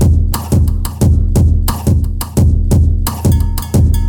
88 bpm high quality drum beat E key, drum loop - kick tuned to the E note. Loudest frequency: 944Hz